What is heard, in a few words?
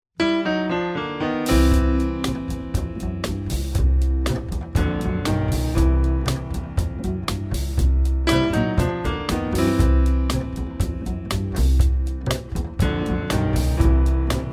Jazzy Groove - Groovy Jazz